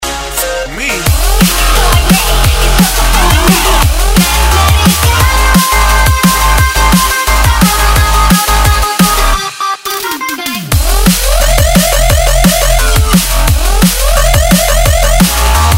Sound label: smooth drum bass remix